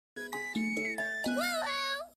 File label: woo hoo; star says woo hoo